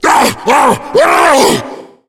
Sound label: tank fire, zombie; Left 4 Fortress